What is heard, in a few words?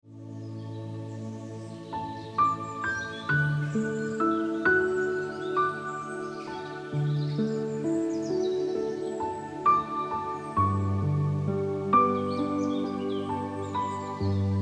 Lofty Hills Ringtone Piano Music